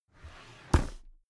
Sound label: drawer cabinet carton open heavy